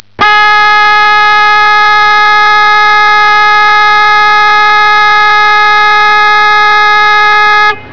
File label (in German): HUPE